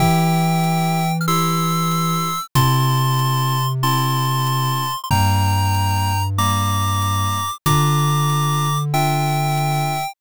bgm mellow

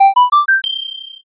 heal

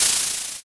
scroll open

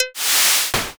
fishing cast

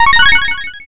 oneup

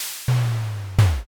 fridge

